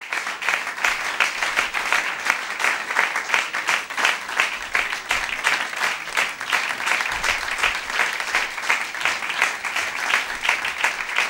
applause small; best service Blue Box

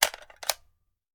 Machine Gun Foley; Sound Ideas; Guns

